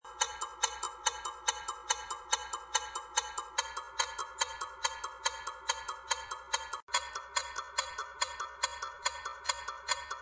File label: ticking